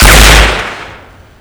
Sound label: snip fire